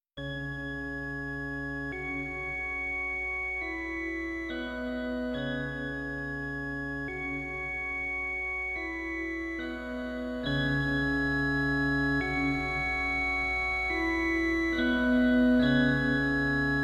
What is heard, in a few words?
Stereo Music for Farfisa Compact Duo Deluxe, Drum Kit; Kranky